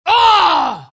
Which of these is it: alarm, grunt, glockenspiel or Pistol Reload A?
grunt